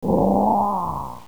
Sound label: Giant Attack